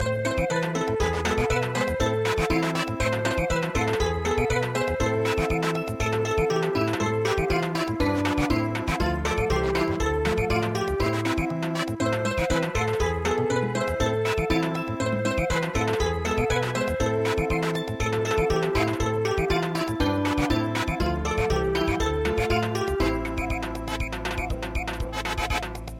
Samba, traditional; Russian Desert

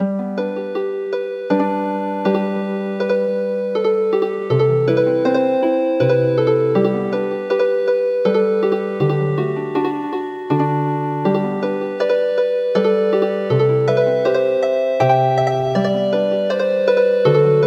Healin Harp

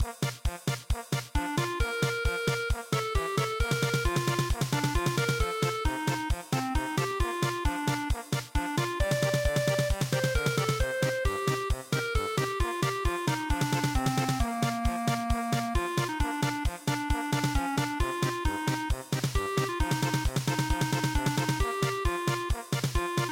polka

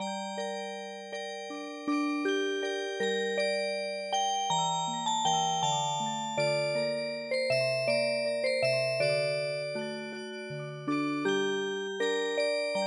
Sicilienne Music Box; スローテンポな3拍子のフランスのクラシック曲です。; Ｇ．フォーレの「シチリアーノ」に癒し系のアレンジを施したオルゴール曲; ＰＣ ＭＵＳＩＣＢＯＸ

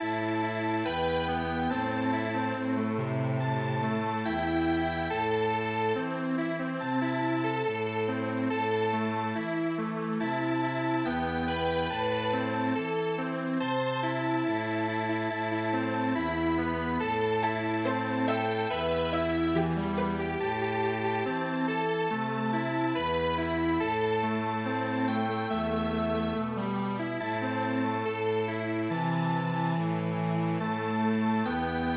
strings

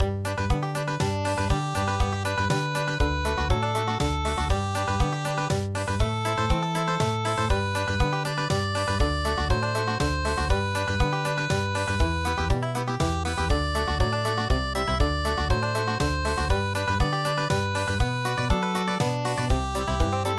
Country, traditional; Durchs Wiesetal Gang I Jetz Na

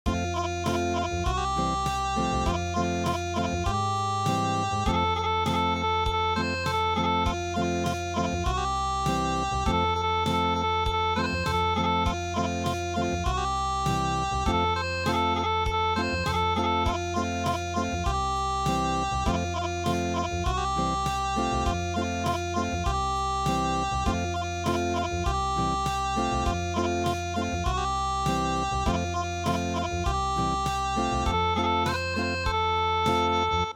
Acoustic; TOYAMA NO KOMORIUTA

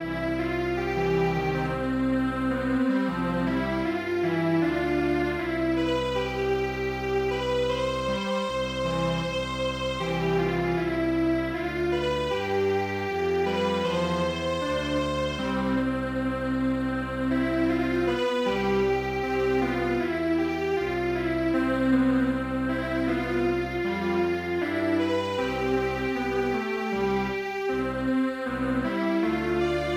Strings Quartet, traditional; midi

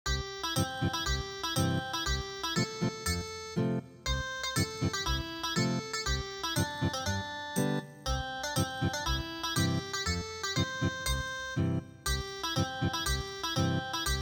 Ethnic Guitar; good bye

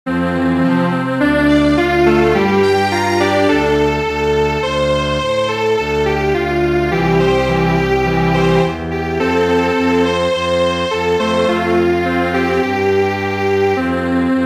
Strings Quartet

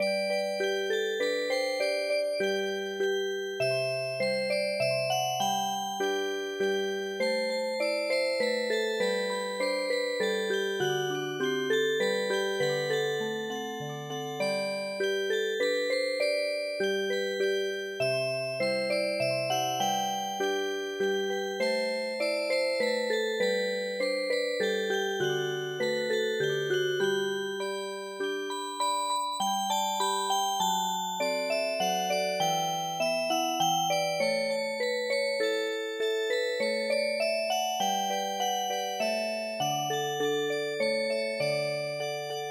minuet Music Box

